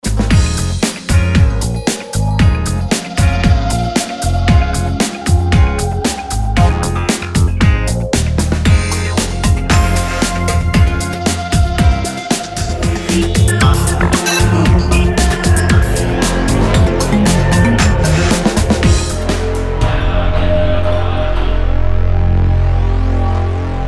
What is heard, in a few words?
POP